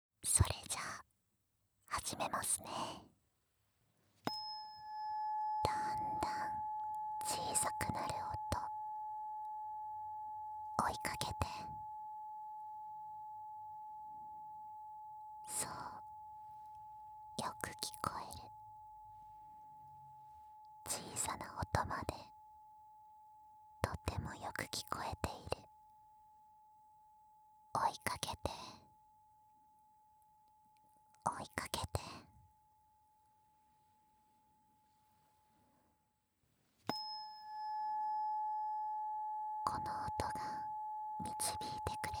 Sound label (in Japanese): 音叉ヒーリング【852x528】